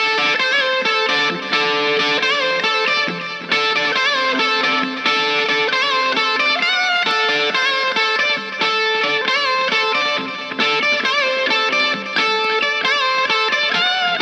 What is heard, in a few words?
Blues D lead guitar 134